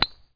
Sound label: driver hit weak